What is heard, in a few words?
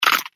Nut Crack